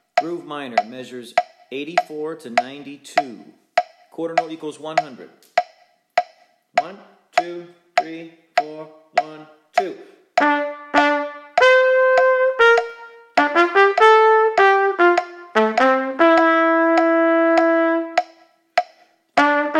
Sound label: Play-along, Groove Minor, qn=100, trumpet